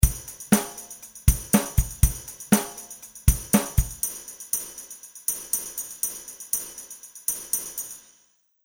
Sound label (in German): Tamburin Deep